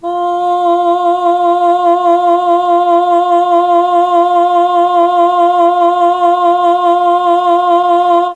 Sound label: F2 FEMALE, mellotron; CHMB FEMALE